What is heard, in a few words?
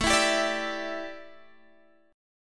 A 7th Suspended 4th A Guitar Drop D, chord